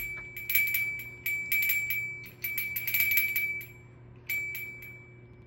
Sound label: OPENING BELL